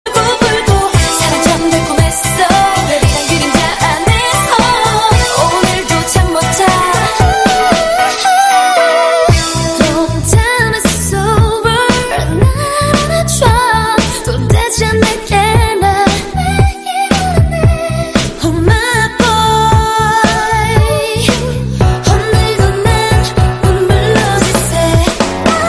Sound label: best korean alone